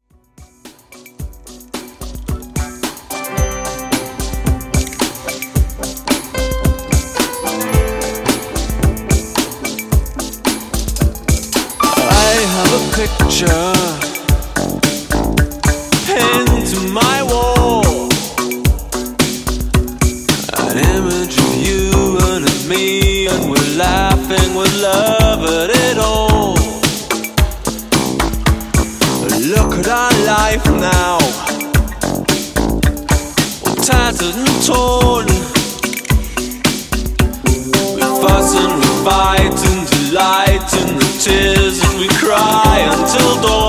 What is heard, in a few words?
80s New Wave Re Drum 110 Bpm